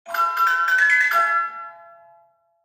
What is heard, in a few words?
stinger loot box rare; heroes of the storm full gamerip 2015